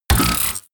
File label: sci-fi click negative medium; hits impacts